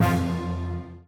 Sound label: Player Air Dash; Crown